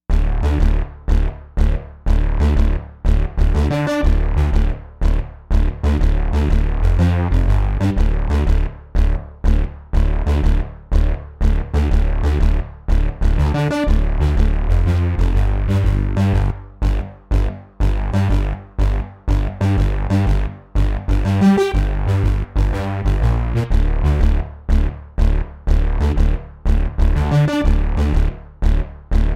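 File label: cello bass; Jupiter-80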